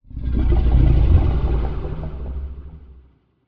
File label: Alien Distant, Monster Sounds; TheDeclineOfWarriors; Monster Sounds Volume II